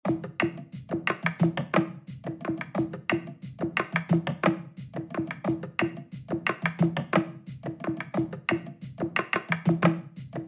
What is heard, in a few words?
percuss